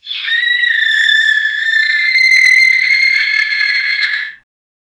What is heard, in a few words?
dinozauri, dinosaur